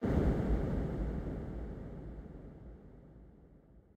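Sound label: Glitch Stop; game audio; MiSide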